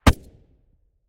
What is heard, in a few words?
fiveseven silencer mono far, weapons